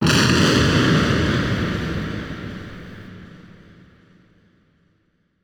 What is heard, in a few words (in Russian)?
vistrel iz kosmicheskogo oruzhiya